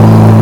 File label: gauntlet low, simulated vehicles; gta5